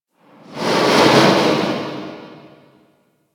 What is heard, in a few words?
Infested Distant Breath, Ambience